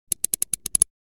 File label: Socket wrench turning slow twist